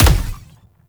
Infested Spore Pistol Punch; Weapons

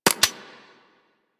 Laser Aim Rifle Alt Mech, Weapons; Grineer

